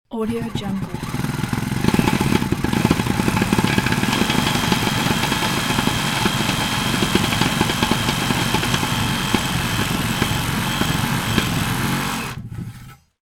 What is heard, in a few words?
cutting hollow bricks using saber saw; industrial sounds